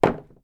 household hedge clipper small down on surface

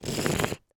human 3 year old boy sniff snotty

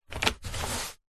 cardboard flat unfolded parcel box flap movement; foley

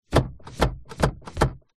car seatbelt pull jammed toyota rav4; zapsplat

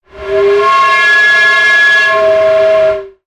sound design effect air pipe pressure; sound effects one

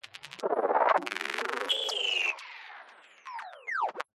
glitch power down

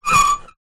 metal scrape on metal small; foley

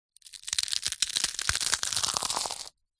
food potato chip crisp crush crunch in hand; zapsplat

